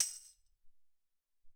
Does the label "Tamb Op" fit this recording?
Yes